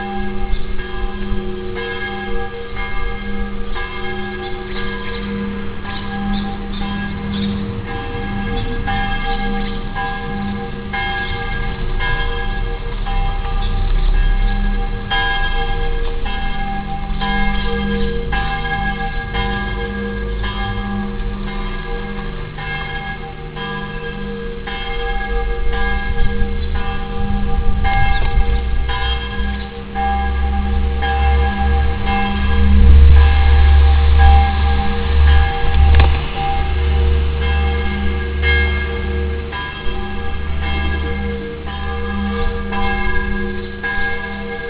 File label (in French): cloches